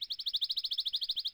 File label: warbler